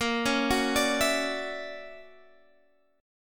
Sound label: D Augmented Major 9th A sharp, guitar